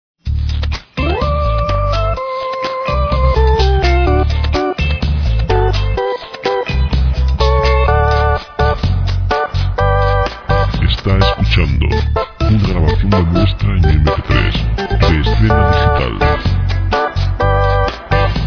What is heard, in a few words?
pop